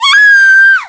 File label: Scream; Zelda screaming